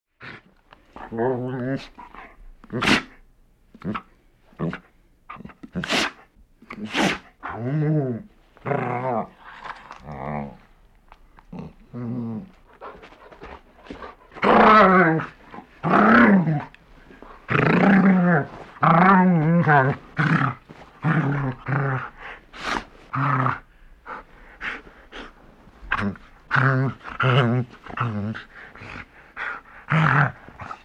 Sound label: Dog Large Growls Snee